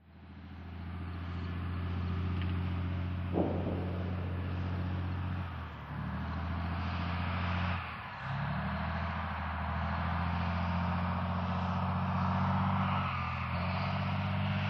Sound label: M-60 Tank: Exterior Driving, Main Weapon Fire Close-up. Stereo